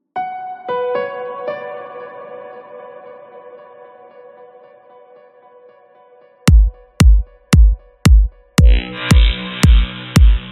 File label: dance deep music vocal deep remix